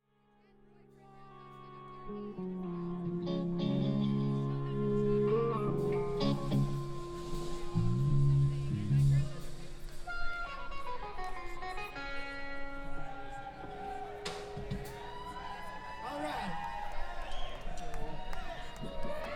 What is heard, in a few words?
Tuning